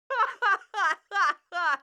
LAUGH

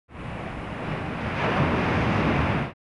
Ocean

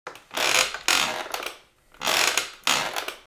rocking

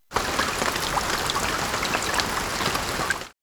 RAIN

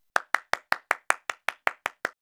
CLAPS

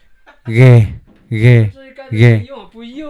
jaw harp

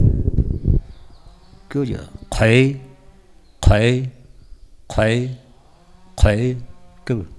dog

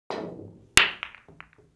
billiard

